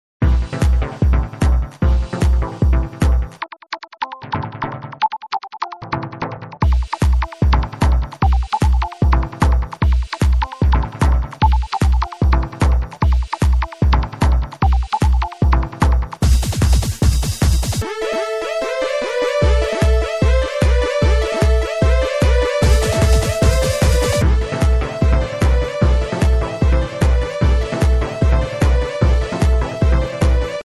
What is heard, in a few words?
Techno Quickie; A quick little bit I put together one day when I was messing around.